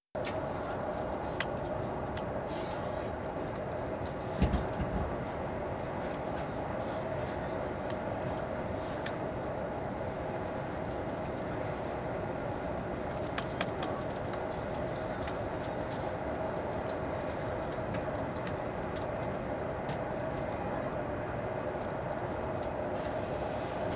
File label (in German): im Zug; Original Video Ton; Fieldrecordings; temporary rauschpartikel Studio 2 Haspelweg 1